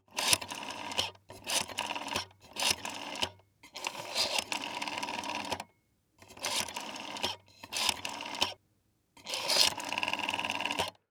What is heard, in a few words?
Household Phone Dial Number Rotary